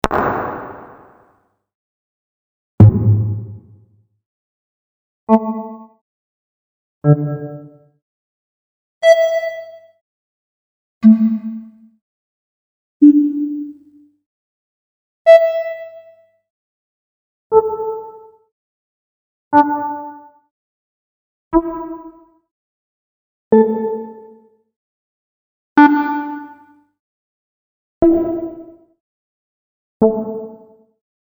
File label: Tenorion